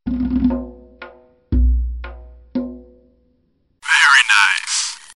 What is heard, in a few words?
bongo very nice; csgo; surftimer